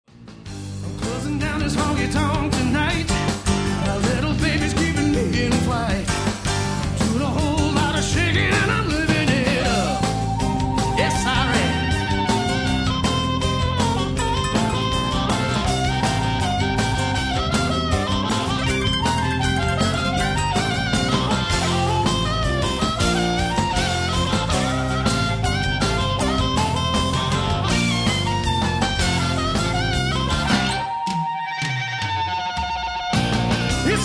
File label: Country-Rock